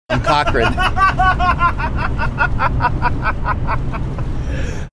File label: having a good laugh